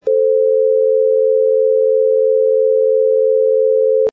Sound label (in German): Kleine Terz Temp, Geige